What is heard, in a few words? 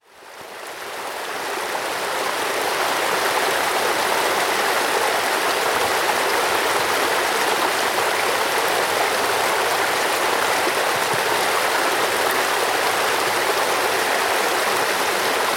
waterfall stairs